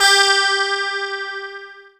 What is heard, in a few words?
GUI CLEAN, E-Guitar